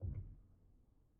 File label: Pedal On, Upright Piano, Knight; Chordophones